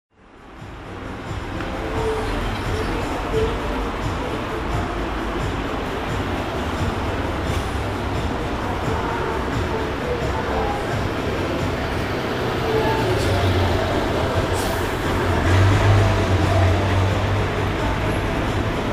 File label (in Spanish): calles musica balcon pension; san luis y laprida; Rosario